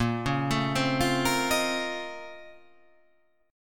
A sharp Minor Major 11th

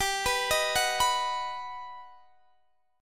G Augmented Major 7th

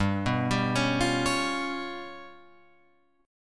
G 7th Flat 5th, Guitar, Open F; arpeggiated; JGuitar

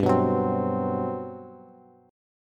G Major 11th, Bass; strummed; JGuitar

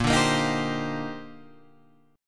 D sharp Major 13th, A sharp, guitar chord

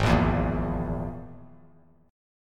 Ab Suspended 2nd Sharp 5th, Absus2#5 chord; strummed; JGuitar

